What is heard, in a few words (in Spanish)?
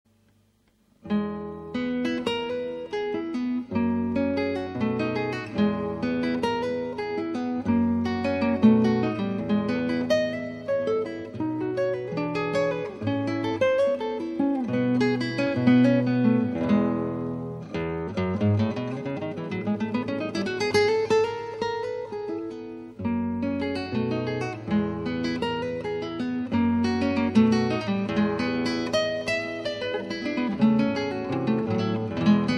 prelude in e, guitarra; villa lobos; no. 2